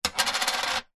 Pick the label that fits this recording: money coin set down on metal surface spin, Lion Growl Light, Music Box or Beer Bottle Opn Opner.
money coin set down on metal surface spin